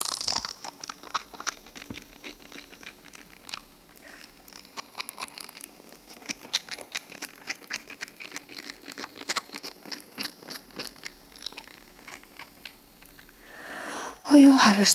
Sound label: wet squishy and crunchy chewing; food drink